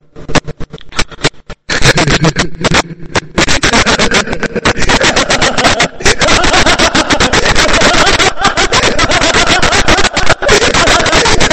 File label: funny hysterical laugh tone ringtone; samsung ringtones